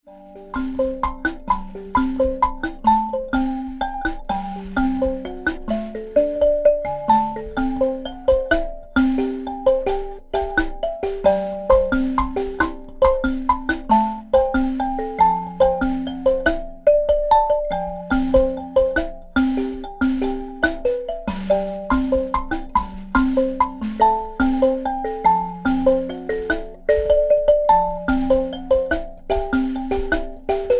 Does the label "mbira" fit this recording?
Yes